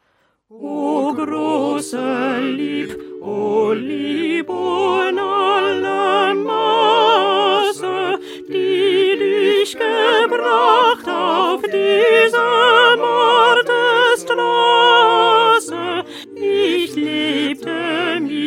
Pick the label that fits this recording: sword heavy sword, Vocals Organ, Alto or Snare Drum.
Alto